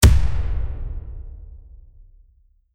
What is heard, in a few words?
cinematic video game sfx, diffuse